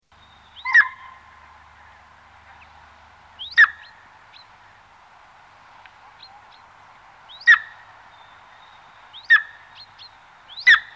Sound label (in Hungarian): Szirti sas; Madarak